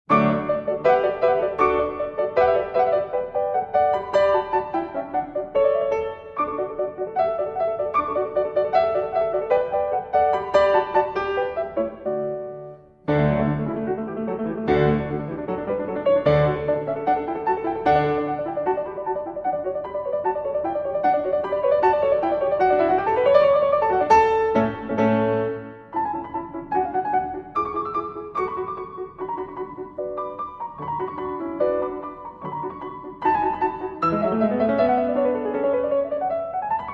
Piano Sonata in D Major I. Allegro Con Brio

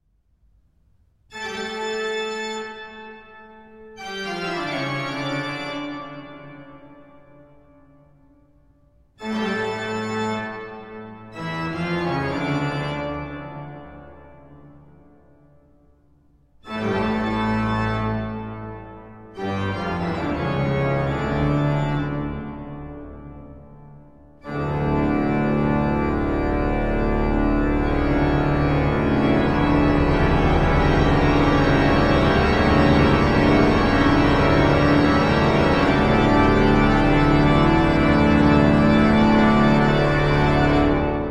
Toccata and Fugue in D Minor, Toccata

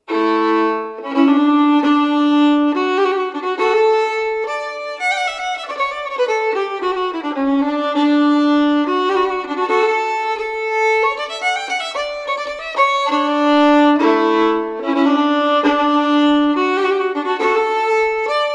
Polska; Efter Sparf Anders

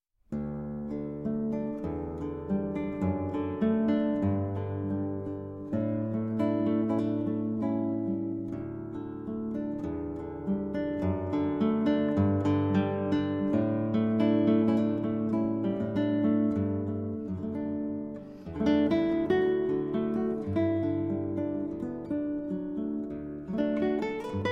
cello and guitar; Traditional; Wild Mountain Thyme

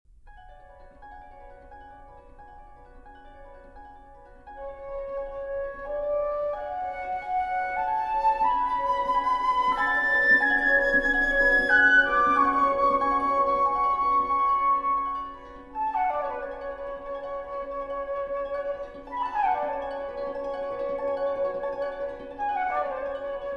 Suite For Flute & Harp; Hovhaness; Op. 245 The Garden of Adonis

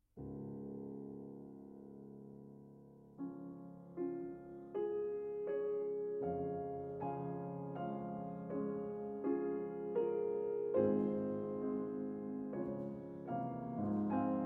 Thema Con Variazioni in D-Flat Major For Piano

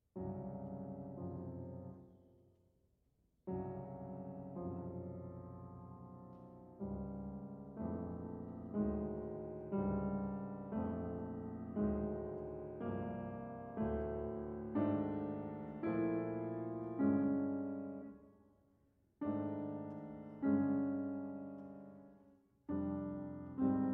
Sonata For Double Bass and Piano

